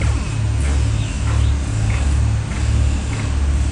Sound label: refinery loop